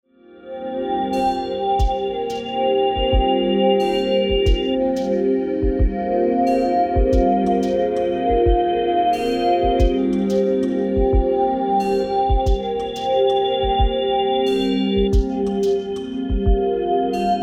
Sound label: Pipe